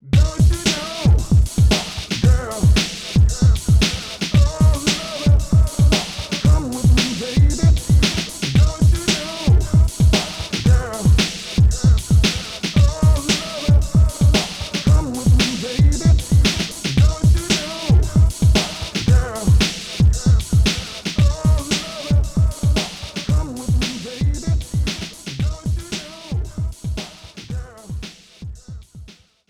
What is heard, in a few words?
Dance; AI The Somnium Files Nirvana Initiative